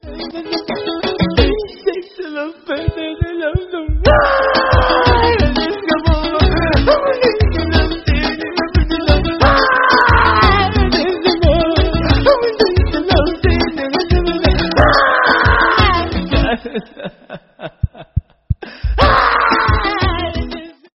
crying potato Meme Sound Effect

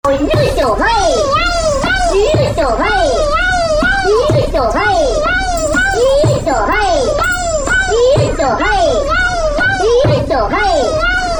Cute Kittens Yosho Hai Yay Yay Yay

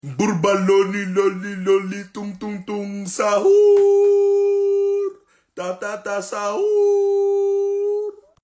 brainrot drill rap

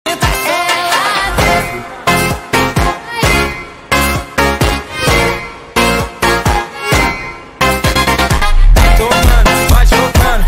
phonk song

